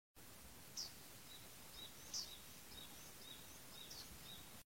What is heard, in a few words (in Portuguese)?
Canto da alveola do japao